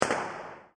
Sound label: Massive Bright Firecracker Bang; Fireworks; Backgrounds